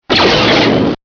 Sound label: Blaster; Star Wars; Star Wars Misc